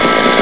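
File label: saw attack, weapons; ParoxysmII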